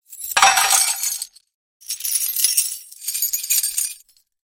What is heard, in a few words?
armor victory